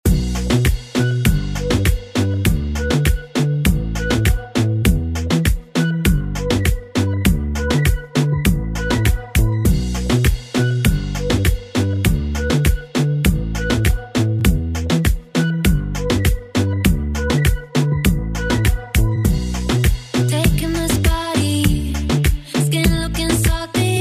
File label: Reggaeton Redrum Intro